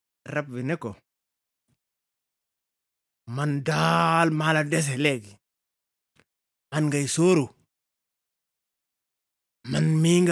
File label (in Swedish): bip